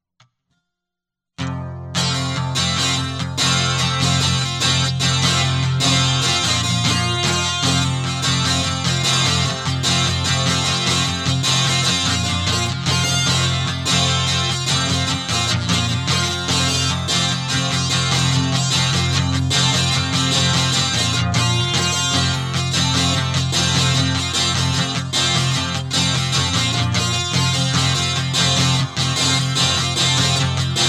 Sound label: Guitar; hyper terrestrial